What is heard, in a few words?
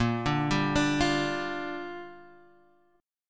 A sharp 6th, Guitar Half Step Down